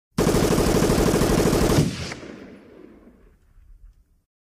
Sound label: rapid fire